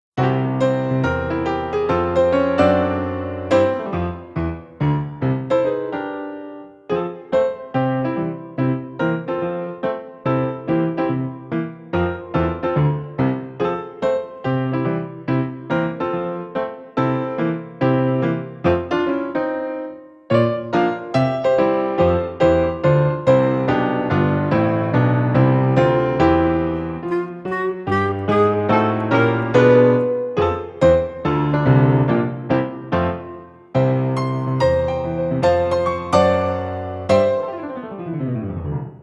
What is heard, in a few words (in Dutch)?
karaoke pianoles